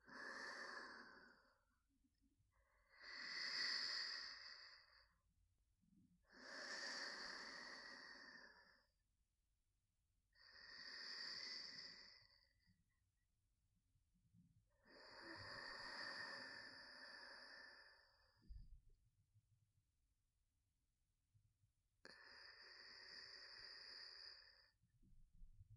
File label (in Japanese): 寝息 右